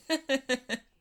laugh; blake

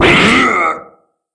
shock

